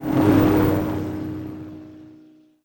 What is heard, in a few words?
talon stop; gdi